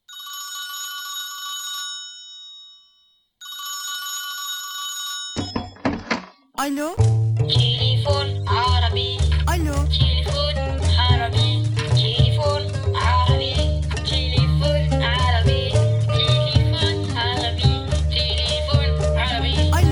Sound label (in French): Jingle arabe